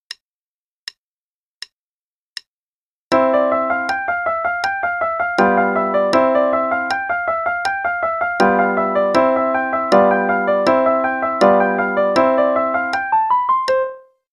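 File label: Play-a-long, quarter note=80; Czerny 50 Short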